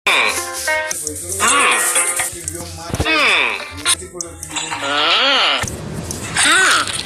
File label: "hmm"; هوم